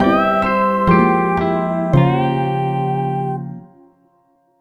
BGM Jingle Game Over; mario wonder

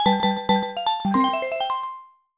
Jingle; Super Mario 64 DS

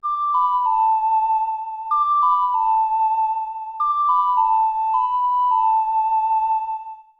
Ocarina; Ocarina of Time

